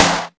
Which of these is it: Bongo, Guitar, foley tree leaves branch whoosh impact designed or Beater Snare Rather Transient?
Beater Snare Rather Transient